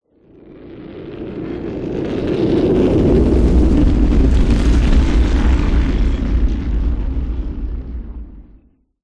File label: whoosh rumble rocks; sound design; zapsplat